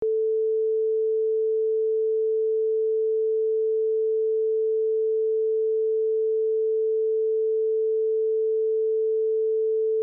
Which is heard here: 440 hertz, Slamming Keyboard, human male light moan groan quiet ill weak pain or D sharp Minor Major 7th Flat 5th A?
440 hertz